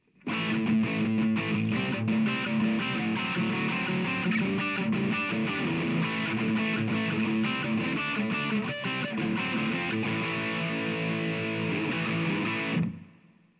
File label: Les Paul metal bridge; Harald König; Blue Note Gitarren Amps